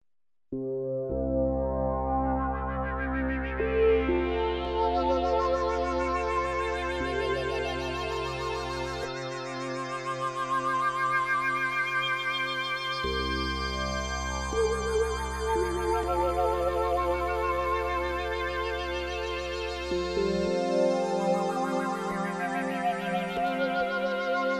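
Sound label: synthesizer harmonic movement slow tempo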